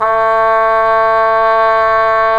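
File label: brass cup mute trumpet, dry; Roland L-CDX-03 Disk 2; Cup Mute Tpt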